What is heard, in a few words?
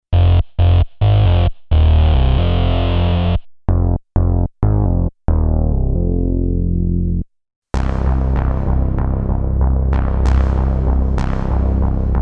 Live Worship Synth Basses